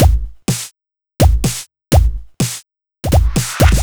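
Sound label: bass drum snare, Drum Loops 125bpm, Nu Dance Electro; Zero-G; Soundclash Nu Dance Electro; Acidized Wavs; Semi-Skimmed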